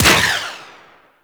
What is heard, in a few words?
Space Turret Shot; Warframe; Ambience; Space Battles